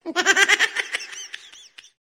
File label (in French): rire aigu